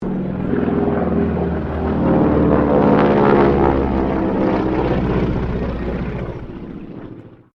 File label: AIRCRAFT AT-6D HARVARD MK III PASS; Harvard Training Aricraft; Airplanes; Vehicles